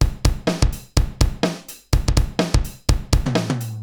Akai XE8 (125BPM), beats

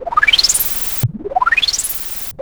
swishings; Zero-G Ambient 1; CRAZY FX

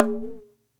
INDIAN PERC

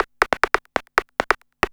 percussion loops, Techno; PS-45 WAV Techno ID

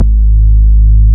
BAS MINI, MOOG; Sound & Vision - Gigapack II CD 1

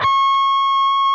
PRS D, Guitars, LEAD; Spectrasonics; Hans Zimmer Guitars VOL-1

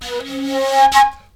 FLUTE GRV; Best Service; World Colours

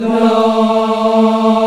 VOX, African Aahs, African Las

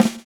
swing

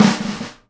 SNARE RUFF, Live Snares

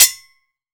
SPOKE HI HAT; SPOKE HHC5

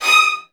VN FX, Virtuoso Strings

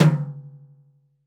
Lite Tom, Roland BK-5

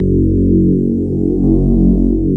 electric pianos; Wizoo Electric Pianos

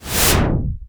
cinematic impact wipe

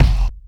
AGGRESSOR, KICKS; Back In Time Records - Big Bang

